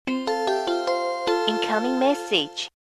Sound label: incoming message; children